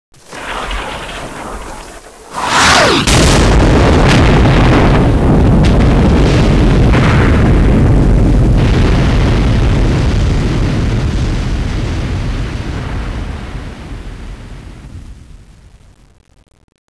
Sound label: arty hit; bg revolution